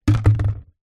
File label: household small plastic halloween tub down on wooden floor